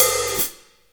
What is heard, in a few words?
HAT